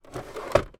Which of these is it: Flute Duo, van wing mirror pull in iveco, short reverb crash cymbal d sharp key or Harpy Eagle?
van wing mirror pull in iveco